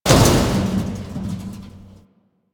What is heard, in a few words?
destructible metal barrel